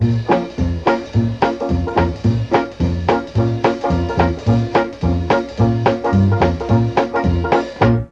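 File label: F#2 dixie trombone, Mellotron; Mike Pinder - Mellotron Archive CD; DIXIE+TRMBN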